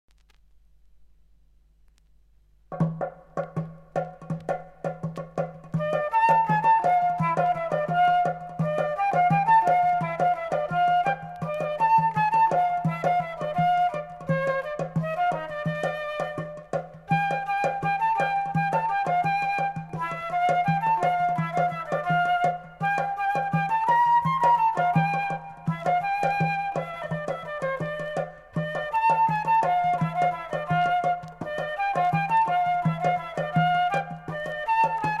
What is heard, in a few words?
Debka; Debka hodel